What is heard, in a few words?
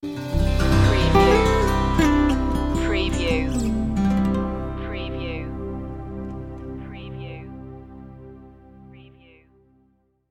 sting